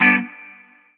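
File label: G Maj guitar chop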